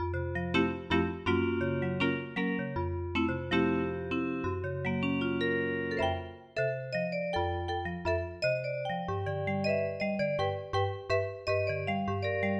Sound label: vibes